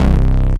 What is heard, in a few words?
Basses Root C